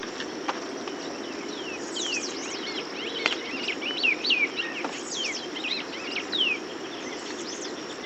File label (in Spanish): Oryzoborus crassirostris occidentalis Semillero Piquigrande